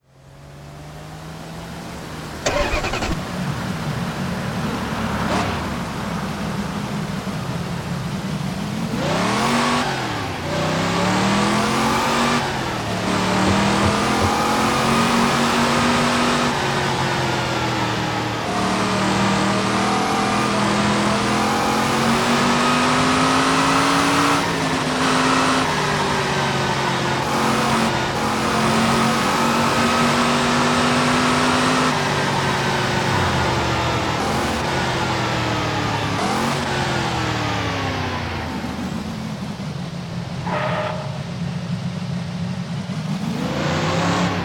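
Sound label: saleen s7 twin turbo, car; TDU-1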